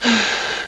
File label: Exhale; Princess and the Pea; SoftKey